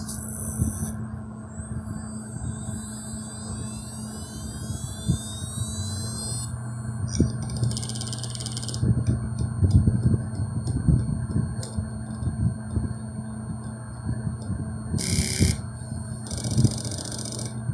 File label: spinup